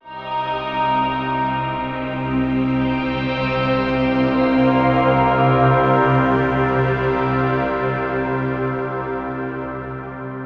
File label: Nordic Bell Pad - Cm 60Bpm; Construction Kits; STEMS; Ghosthack; SkyRealm; Kit 06 - Before The Storm